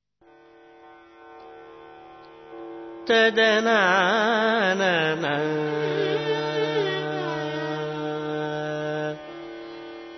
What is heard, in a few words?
Tamil Classic; RKC Live Stream; Indian Artists